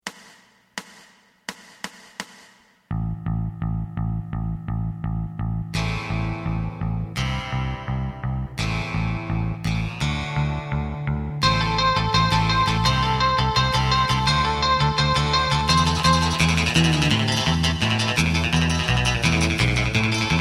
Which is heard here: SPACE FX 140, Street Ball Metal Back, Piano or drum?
drum